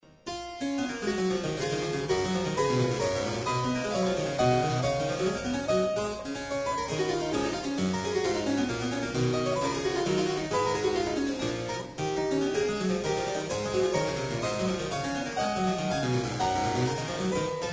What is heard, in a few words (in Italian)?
Altro Passo E Mezzo, harpsichords